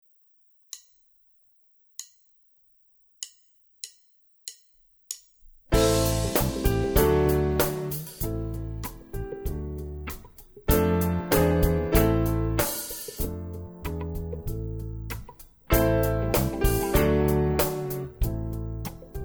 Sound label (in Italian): Piano forte - play along